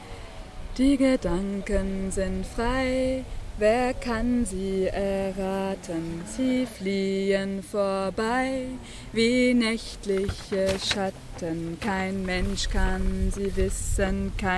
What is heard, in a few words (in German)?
die gedanken sind frei basse